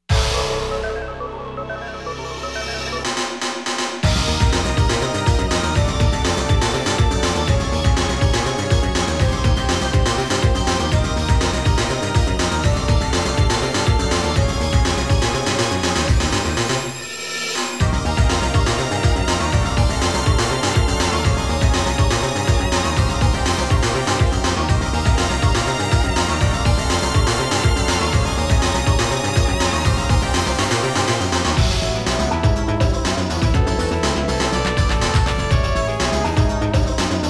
Ambience Of The Underground; Stage 4 BGM; X-Men

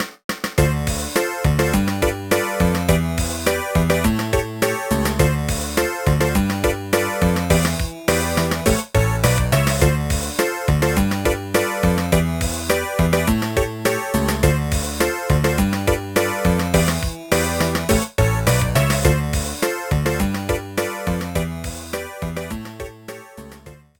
BGM; Kageyama Hideo no IQ Teacher DS Kangaeru Chikara to Oboeru Chikara

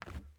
plastic jump

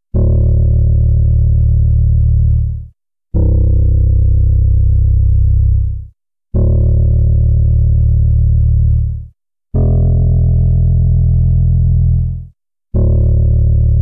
Bass; Knuckle Sandwich

